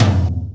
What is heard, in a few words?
tom; drums; virtual drum sounds